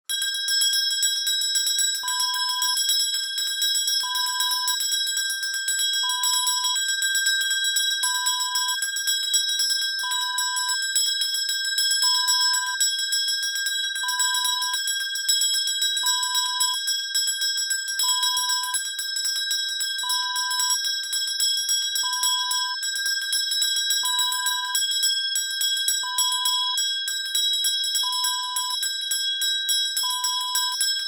handbell

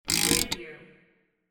clock wind

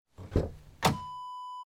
car door open

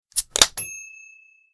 Beer Bottle Open Opener

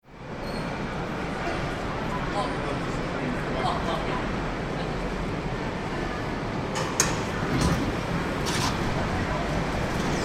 Gatwick Airport Shuttle Train

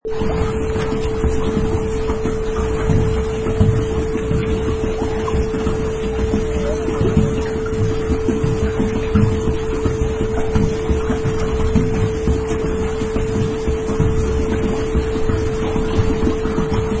Paddle Boat

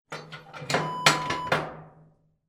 sauna stove door close

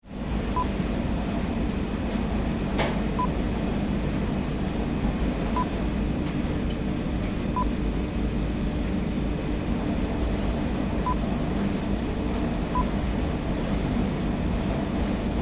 Launderette Ambience

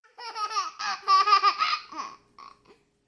Toddler Laugh

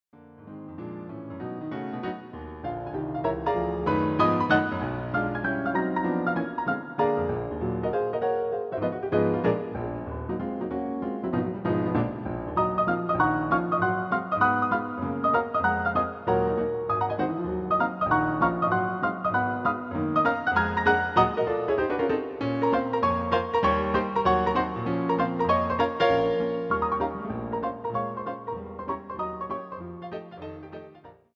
piano roll; Gershwin; Freddie Rich

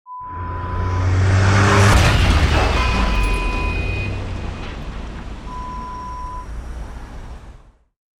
Car Crash

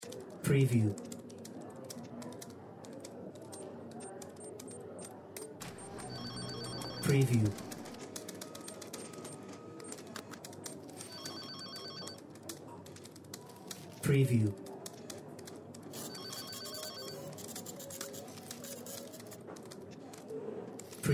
office ambient noise complex

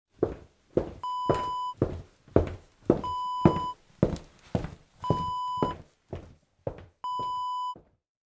footsteps high heels

